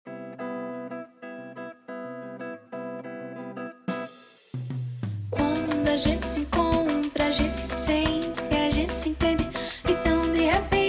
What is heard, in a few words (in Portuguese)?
Samba aquece coração